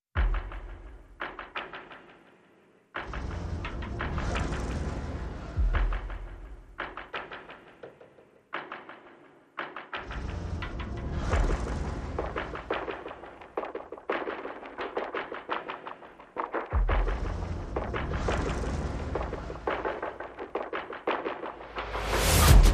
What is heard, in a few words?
Perc and FX